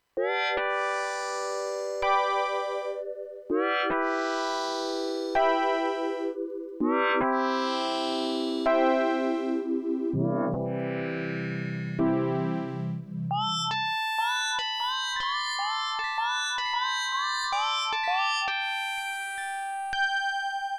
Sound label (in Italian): Suono generato con X FORM